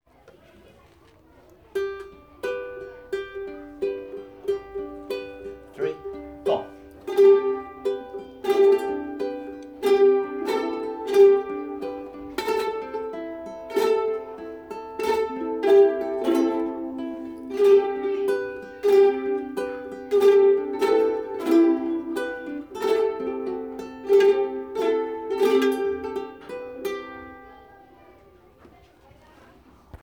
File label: Evening Melody